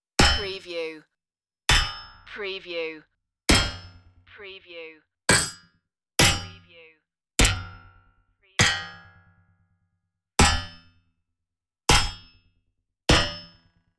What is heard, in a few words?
metallic impacts